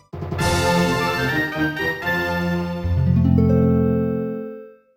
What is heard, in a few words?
[Fanfare] Pikachu learned Surf!; gamerip